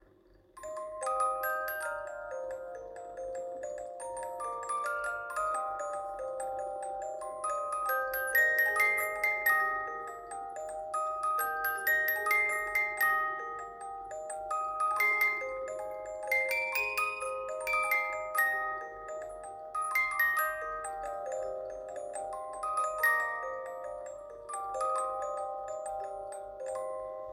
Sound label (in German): Handyaufnahme des Spielwerkes